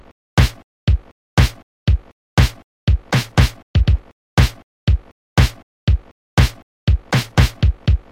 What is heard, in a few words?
80ies Snare Track